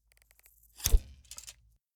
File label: bow attack